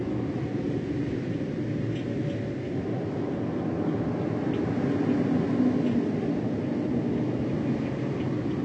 space ambience; android ios windows 2015; angry birds 2

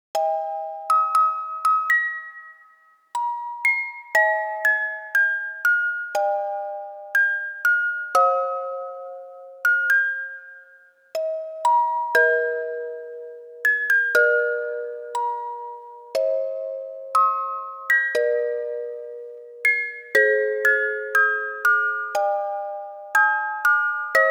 Blume [orgel ver]; Chronobox

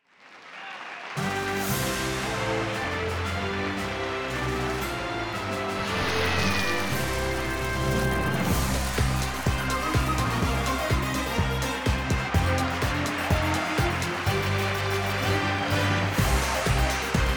Intro Fanfare; buzz brain of the uk 2009 ps2

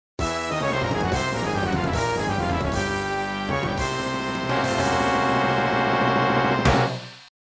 Death

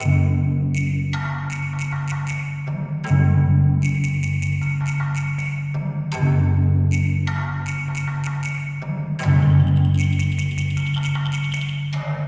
music level

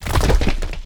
Rock Crack Deep; Lucius III

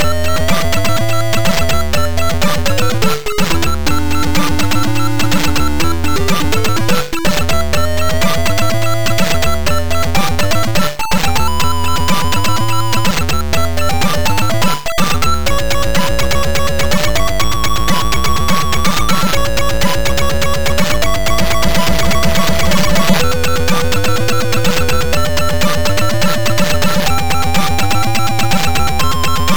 Level BGM; prehistoric tale a; atari st

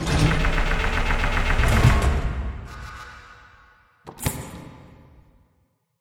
doors jammed; Poppy Playtime

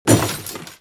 Shredder Break

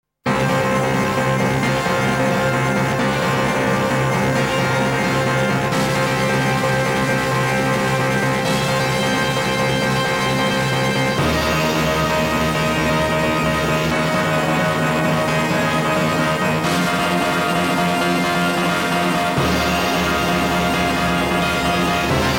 3D Stage BGM; Konami Music Masterpiece Collection

